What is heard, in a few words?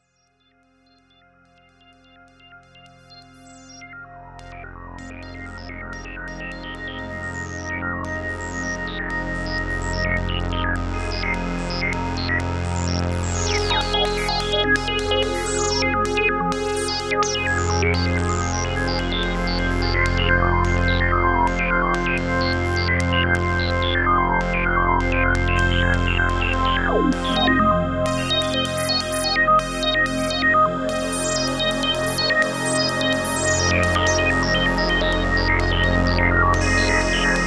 3track wavetable